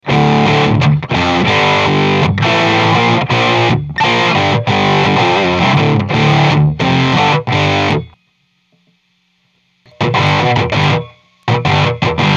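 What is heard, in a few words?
high gain, amps; Hi gain example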